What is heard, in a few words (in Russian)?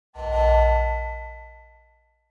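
звуки концовок outro исчезание затухание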